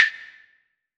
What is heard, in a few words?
Lead F6